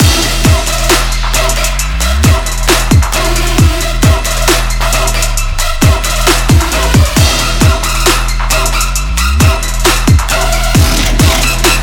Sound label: TRAP LOOP bpm134; 16 or 32小節のリズム・トラック